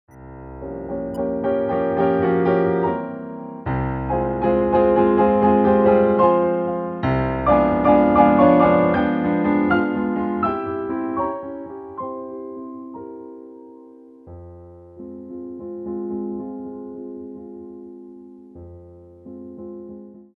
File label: PIANO Prélude